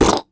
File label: Human Beatbox, Beatbox Hits